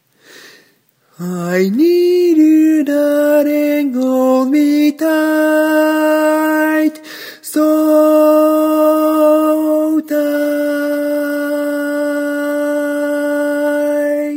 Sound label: Lead, barbershop tags